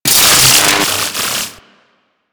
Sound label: Ocean Electric Surge Large